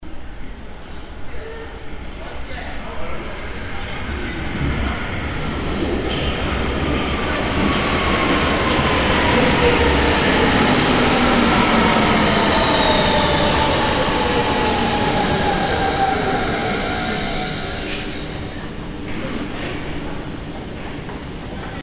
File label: subway arrival